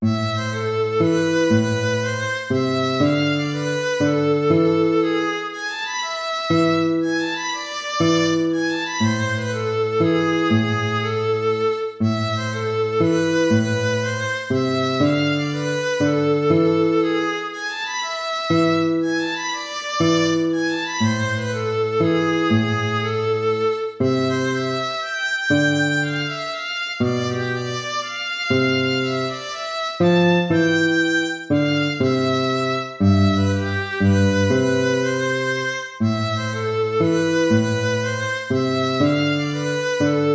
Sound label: baroque minuet minor